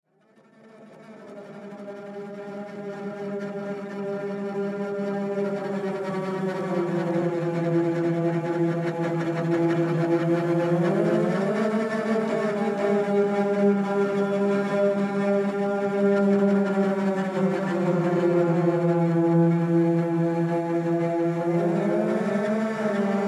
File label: Cello PSP ECHO St